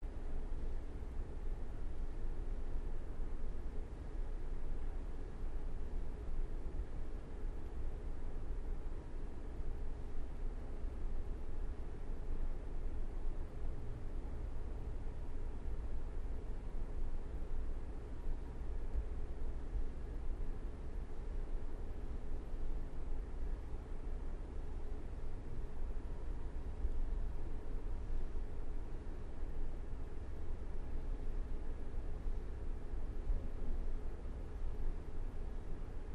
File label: Air Fill Church Tone; air tones